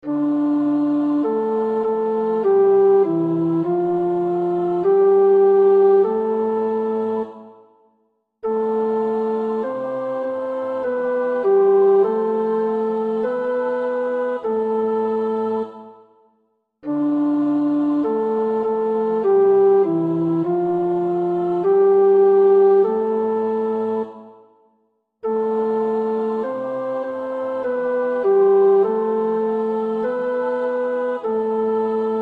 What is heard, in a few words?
Psalm 91 (tenor); Oefenbestanden; Claude Goudimel